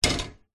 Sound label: household teapot vintage brass set down on oven hob